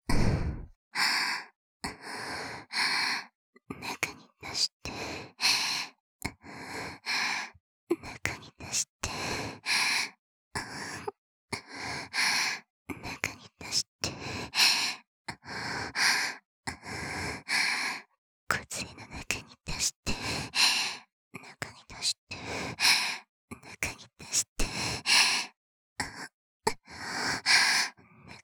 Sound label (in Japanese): 喘ぎ